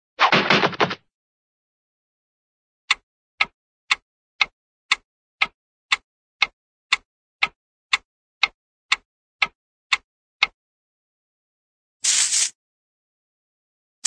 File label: catapult, cartoon catapult fire